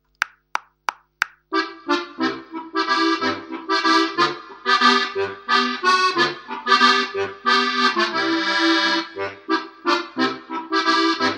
Landler (1. Stimme)

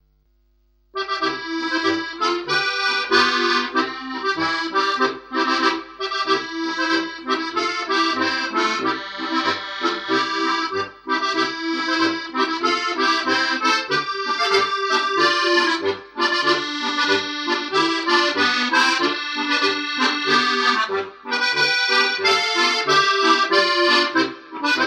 polka (1. u. 2. Stimme)

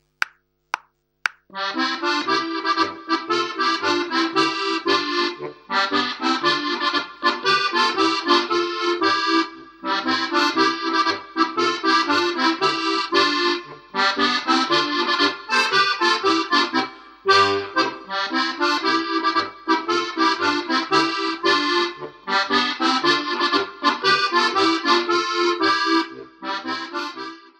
Polka (2. Stimme)

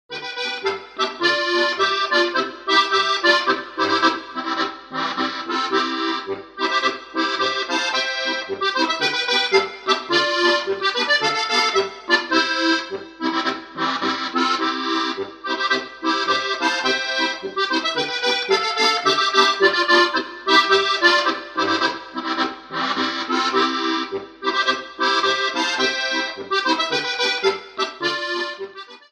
Polka

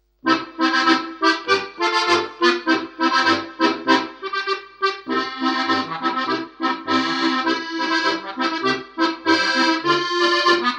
Marsch (1. u. 2. Stimme)

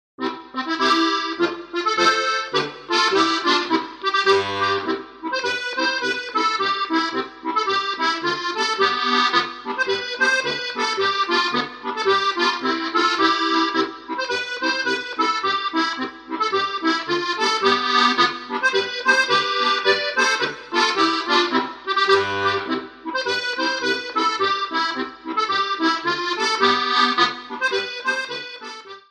Marsch

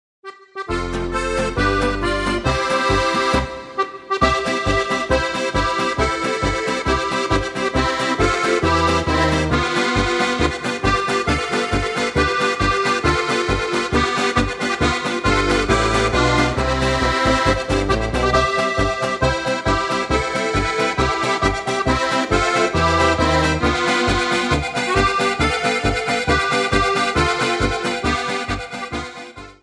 Polka

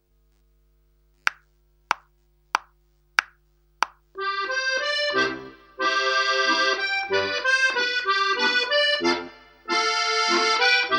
langsamer Walzer (2. Stimme)

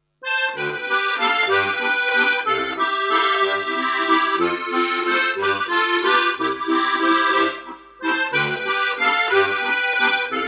Walzer (1. u. 2. Stimme)

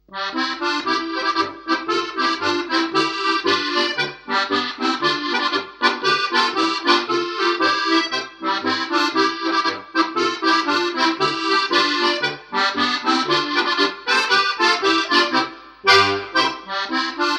Polka (1. u. 2. Stimme)

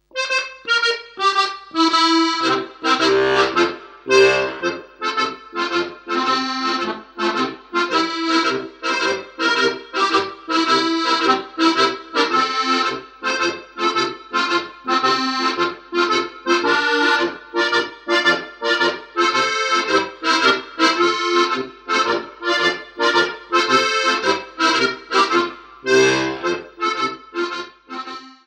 Tarantella (1. u. 2. Stimme)

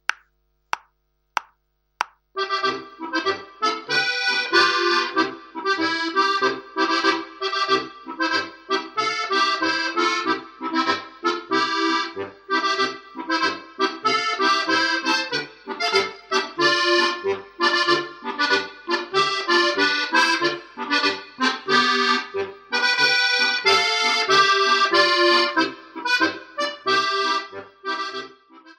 polka (1. Stimme)

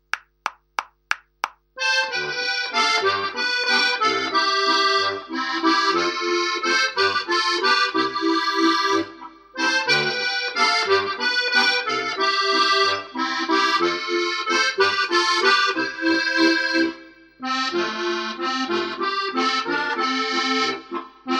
Walzer (2. Stimme)

